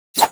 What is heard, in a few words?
Swing; Dual Zoren Prime; Weapons